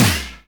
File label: CYM CYM FX, Analog Drums; Roland L-CD701